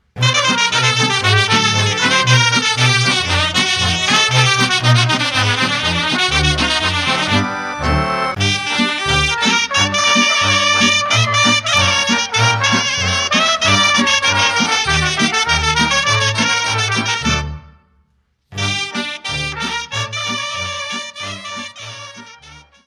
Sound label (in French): Ardeleana, Roumanie